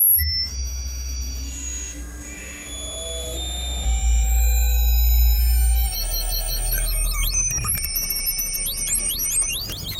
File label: an alien device powering up with strange electronic beeps